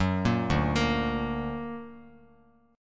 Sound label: D Augmented D down Bass Standard